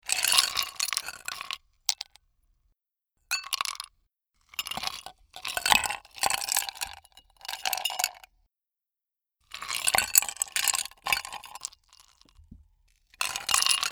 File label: ice